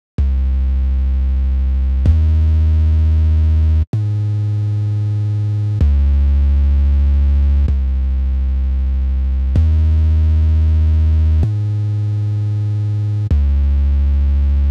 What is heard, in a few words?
bass